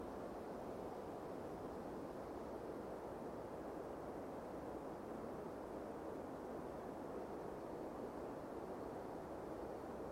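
long quiet sound of driving on a highway along a forest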